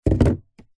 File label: industrial power steering fluid in plastic bottle set down garage floor